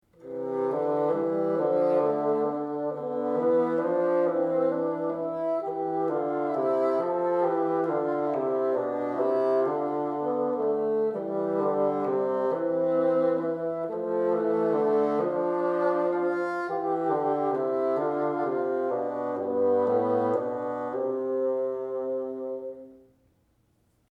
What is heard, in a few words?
exercise together, bassoon